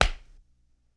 soft slap

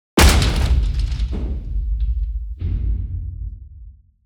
Kicking the iron door; افکت صوتی

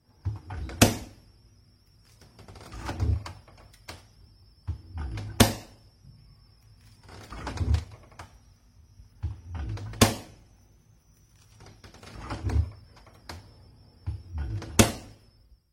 open refrigerator; افکت صوتی

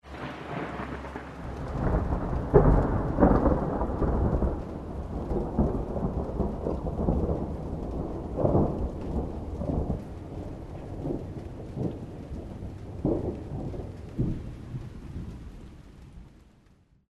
Thunder and rain

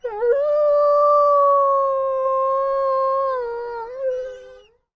Wolf; افکت صوتی

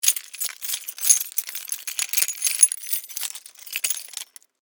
Keys Jangle

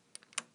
Open and close the lock

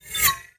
Woosh dry; افکت صوتی

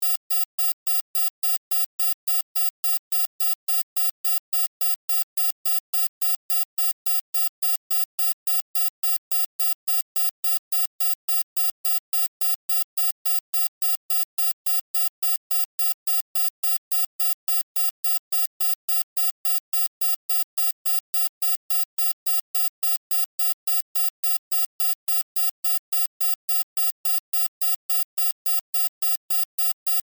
bomb; tiktok bomb; افکت صوتی

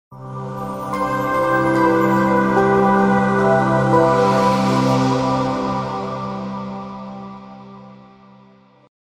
Elegant Logo Intro

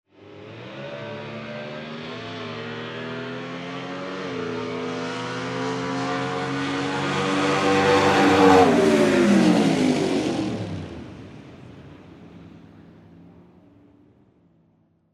race car; افکت صوتی

